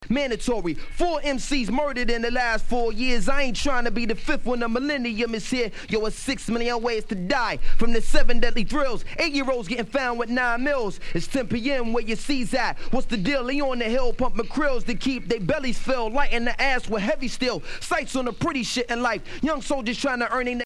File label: acappella